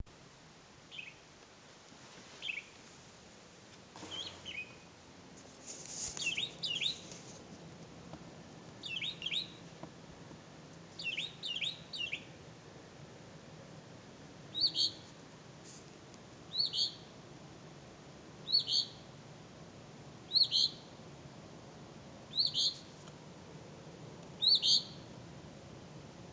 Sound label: bird